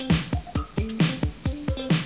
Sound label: beat